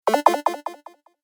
Retro Gaming Sound; Video Game Sound Effects

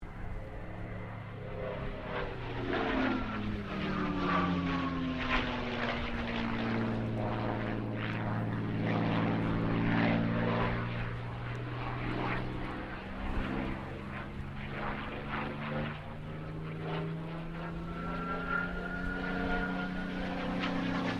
AIRCRAFT CAC CA-18 MUSTANG MK23 PASS WINDY; CA-18 /P-51 Mustang; Airplanes

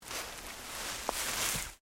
LEAVES MOVE MINOR, Rustling

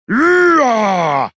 Yell Throaty, Male yell, throaty, cartoon; Yelling

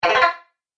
Message Alert, beep

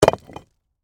Impact Rock Drop, Heavy, On, Rocks; BlastWave

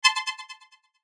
CHIME DELAY; Multimedia; Chimes; Blastwave

